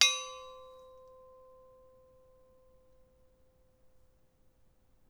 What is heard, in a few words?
Kei; Instrumentensammlung